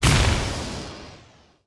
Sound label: Eye Break